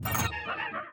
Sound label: archeology log entry negative; ancient relics